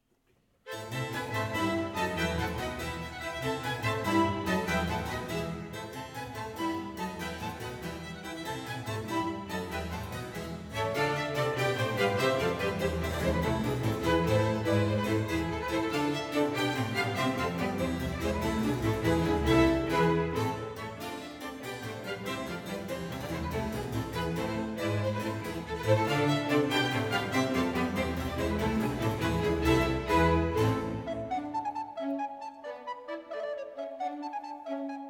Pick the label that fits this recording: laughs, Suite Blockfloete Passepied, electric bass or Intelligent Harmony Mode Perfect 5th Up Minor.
Suite Blockfloete Passepied